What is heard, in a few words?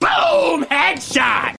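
boom headshoot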